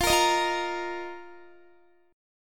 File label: F 7th Suspended 4th, Guitar; strummed